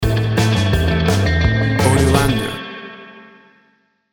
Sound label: 60s Surf Instrumental Music Stinger